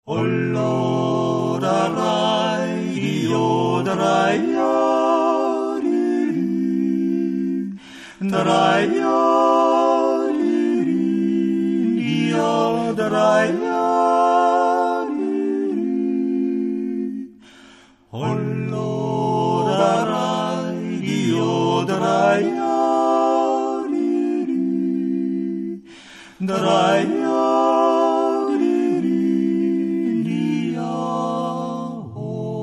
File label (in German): maennerquartett